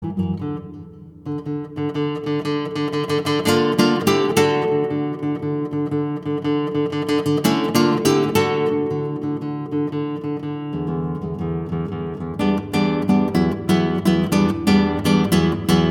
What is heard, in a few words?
guitar sonata excerpt; classical guitar; recorded at Braeburn Studio in Wellington, New Zealand in 2012